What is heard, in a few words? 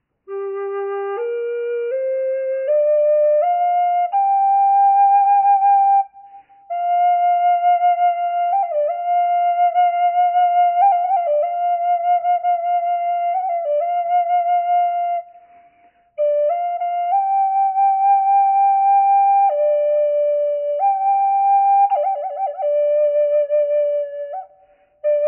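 reverb, flute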